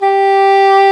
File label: ENGLISH HO; E-MU Formula 4000 Series Vol. 5; Protozoa; Proteus2 Instrmt